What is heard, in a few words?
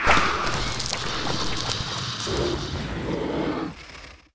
DEATH, aliens drone; svencoop